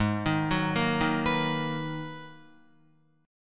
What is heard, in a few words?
Gsharp Minor 9th, Guitar; arpeggiated; 3,6,6,3,3,7; 4F,4A,5C,5G,5C,6E